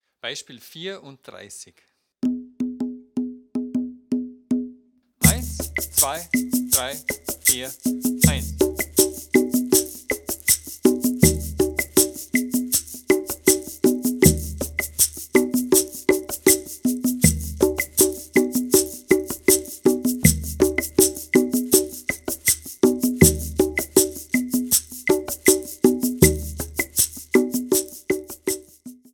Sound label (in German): viererrhythmus